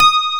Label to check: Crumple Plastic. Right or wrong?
Wrong